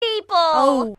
oh

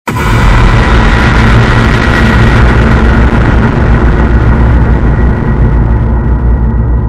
creepy impact

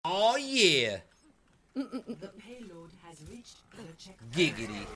awh yieee

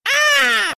bird notification sound

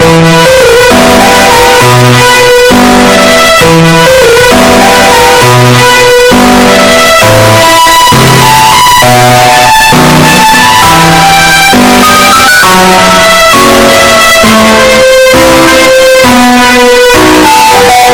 bass boosted; plants vs zombies choose your seeds

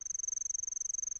insect kusahibari

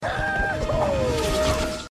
yahoo

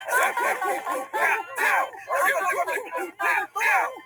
conga line

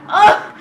ugh

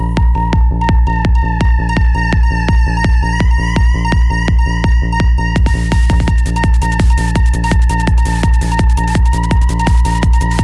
hardcore trance

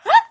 alert

